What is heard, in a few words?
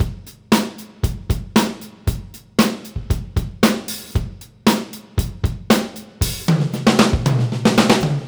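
Beat Loops, 116 bpm; Zero-G; Monster Beats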